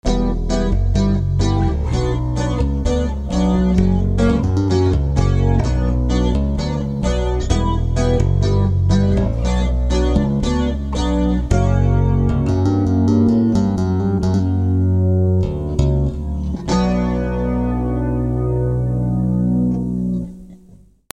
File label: stick